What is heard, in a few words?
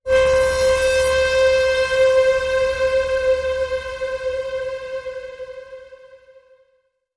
Eerie Swell